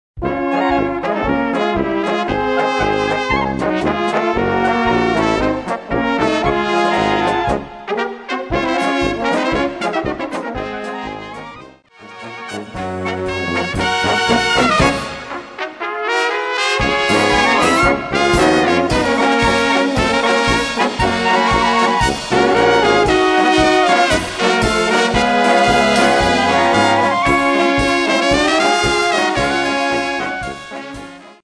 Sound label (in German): Polka